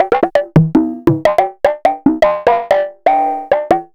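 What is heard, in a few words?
ms20 processed congas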